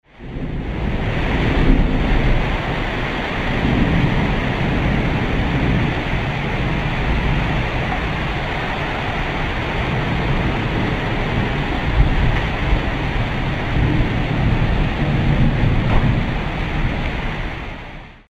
HEAVY RAIN

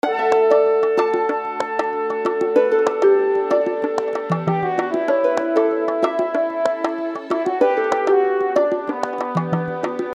transition soft

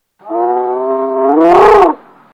Camel

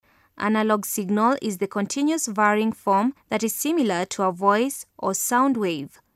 Analogue

